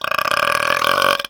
BURP, CARTOON

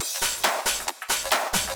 Dance Grooves

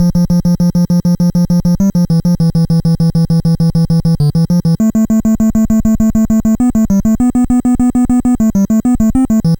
NES Bass, chiptune

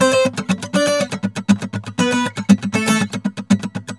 GUITAR SALSA

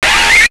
Shot; 90 sSampleCDs; Coldcut's Kleptomania VOL1

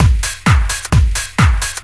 groove, DRUMLOOPS; Techno Trance; Ueberschall; Techno Trance Essentials

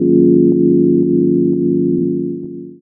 Chords Tones; Equipped Music; Loungin House

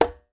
QUINTO, LATIN percussion; Voice Crystal - Steve Reid Definitive Percussion; LATIN SET

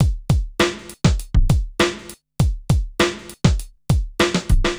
Street Tip, R&B Drums

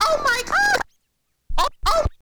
Scratch

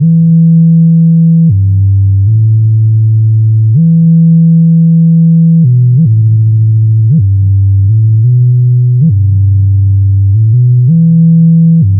Pure Tones; Sonic Foundry (Sony Creative Software); Loop Noir

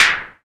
DMA SP-12; 90 s Sample CDs; Sound & Vision - Gigapack I CD 1 (Roland)

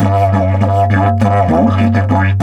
DIDGE 100BPM, Didgeridoo; Big Fish Audio - Didgeridoo and Other Primitive Instruments

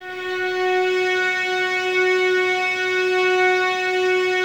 violins F#4, string ensembles; Miroslav Vitous; 11 Violins

